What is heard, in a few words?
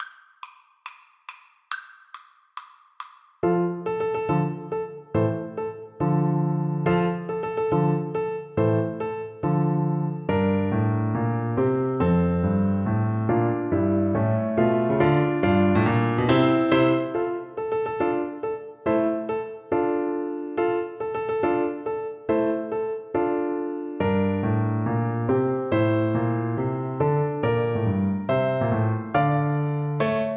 nutcracker, Bassoon